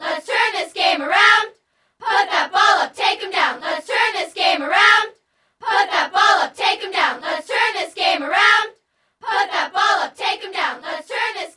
Basketball; Sfx Basketball

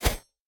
Slashing Short

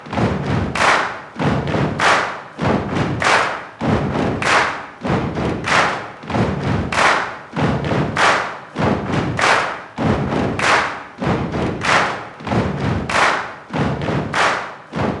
Basketball; Sfx Basketball

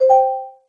Golf

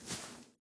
Sfx Darts

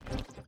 Timer Restart

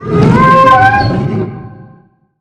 Monster Death; Monster Ralph

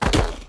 Enemy Strigger Run

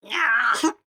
Attack Yelp

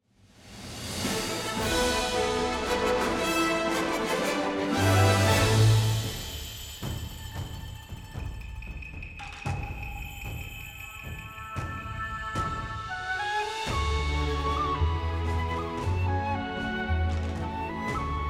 bgm quest result Clear; Monster Hunter Rise; Xbox Series X/S

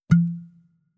Monnlight Pop; Legend of Keepers (gamerip) (2019)

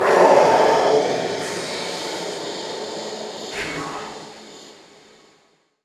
Finalboss Vox Death

